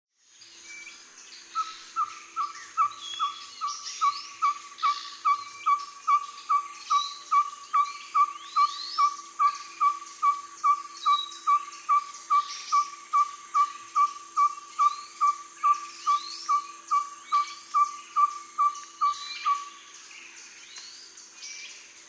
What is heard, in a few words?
Ferruginous Pygmy Owl Glaucidium brasilianum